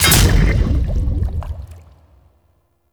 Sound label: Maw Fishing Attack Impact; Warframe; Duviri